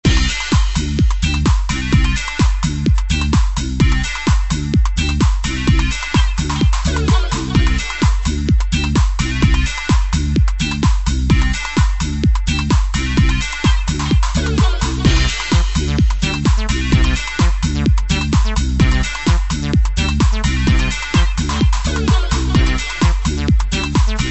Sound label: 128bpm